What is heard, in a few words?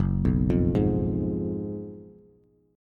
Gb Major 7th Suspended 2nd Suspended 4th, down, Bass; arpeggiated; JGuitar